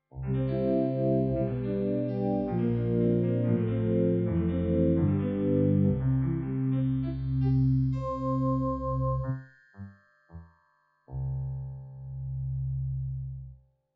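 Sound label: K150 synth celeste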